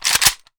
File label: ak74 cock; weapons